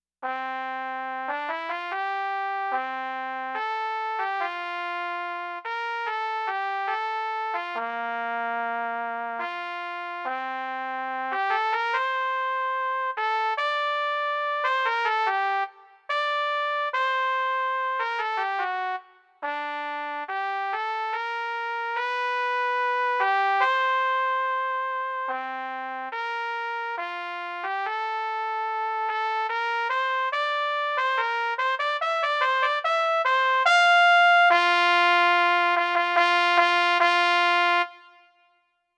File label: Trumpet Lyrical